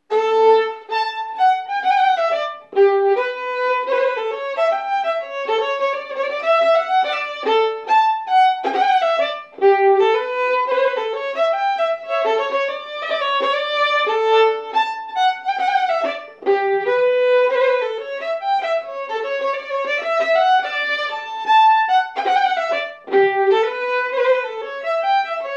Bingsjoschottis; e Agenmark

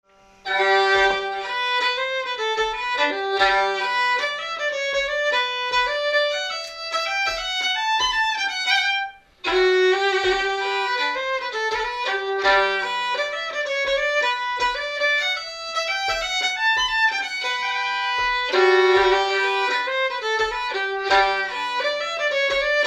Polska

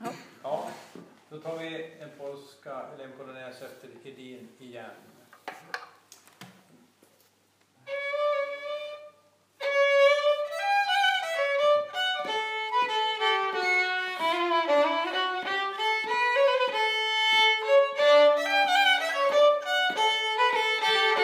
Polonäs

